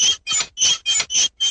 metal hinge creaking

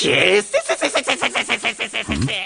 kesesese laugh; prussia

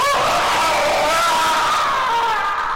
jumpscare sound; five nights at wario's

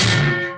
clang and thud

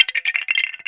crowbar

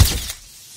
flash camera

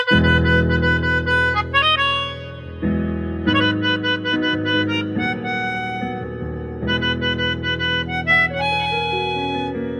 melodica

